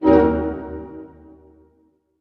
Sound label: HARP HITS D# MN, Orchestra; Sound On Sound - Peter Siedleczek's Orchestra